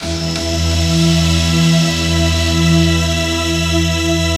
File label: E, Pads; Zero-G; Total Drum Bass